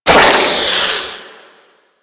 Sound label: Proto Infested Mutalist Big Jump Flesh; Warframe; Abilities